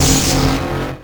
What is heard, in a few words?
Pickup Lightning Shield; Pickups